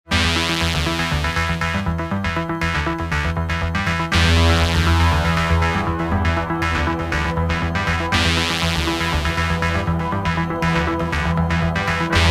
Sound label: ringtone